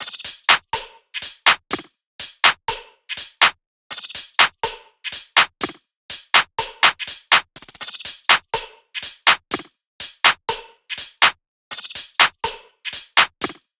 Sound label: loop top 123, Seismic Loops